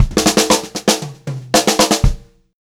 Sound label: FILL, Pop-Rock Drumloops; USB Soundscan vol.37; AKAI; 90 s Sample CDs; 120 SPEED